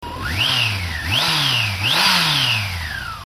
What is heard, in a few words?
oem rev, cans